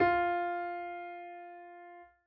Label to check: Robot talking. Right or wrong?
Wrong